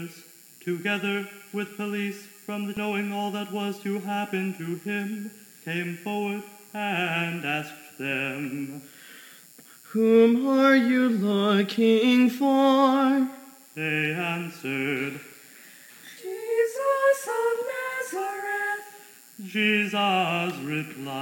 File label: Choral Passion Gospel; Choral Scholars